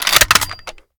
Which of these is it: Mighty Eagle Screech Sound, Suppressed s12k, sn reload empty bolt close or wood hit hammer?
sn reload empty bolt close